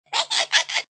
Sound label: Evil Doll Laugh; An evil doll laugh; Evil Laugh Sound Effects; Sound Effects:Horror